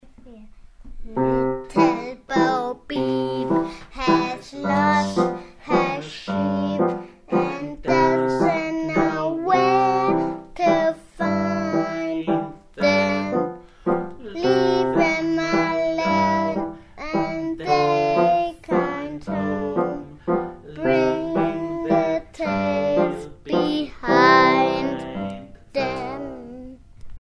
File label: Little Bo Peep (Gesang)